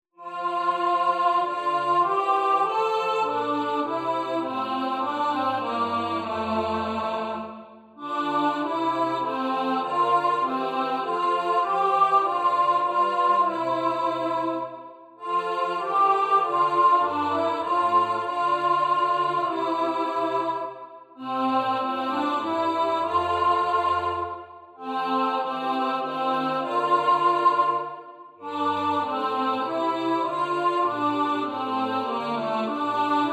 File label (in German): 3 stimmig Alt